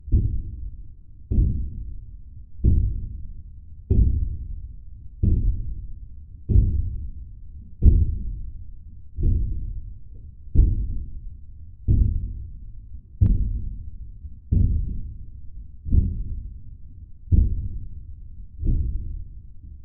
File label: Bang Prison Door Loop